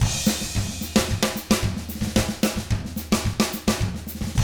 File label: 110 SAMBA, Drums; ILIO; Double Platinum Drums 1